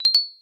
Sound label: ding up